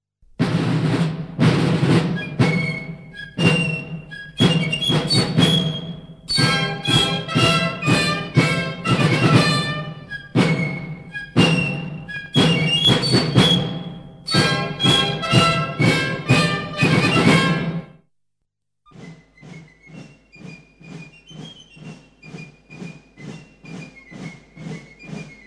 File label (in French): La Charge; Napoleon; hymnes Napoleon